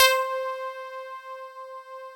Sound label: clavinus C5; CLAVNUTZ; Synth